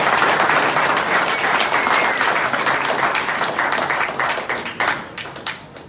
applause house

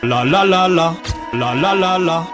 lalala

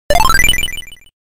neo geo insert coin sound; snk

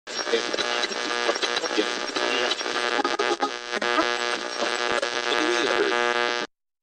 radio

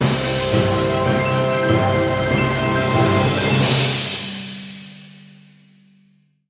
orchestral

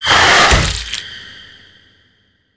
wrong door haha

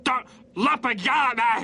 yell; lsp lumping

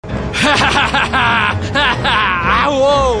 hahahaha wow; hermes renato

